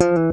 Slap Bass

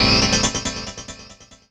KEY SHOT TEKNO 140 A; Techno2; Singleshots

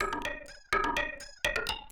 PERCLOOP INDST 125, Industrial Loop

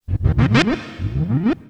SPACE FX 140; SpaceSounds

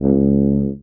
Contrabass Tuba Straight C#1; DirectWave; Varazdin Orchestral

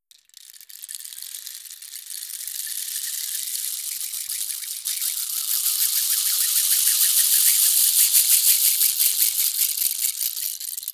ETHNO EXTRA 90; ethno2

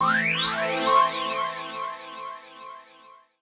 KEY SHOT TEKNO 140 A; Techno2; Singleshots

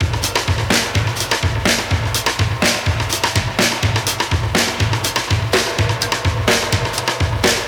125 Classic Breaks, 125

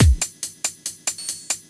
TECHNO BEATS 140

